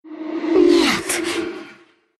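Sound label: death, Russian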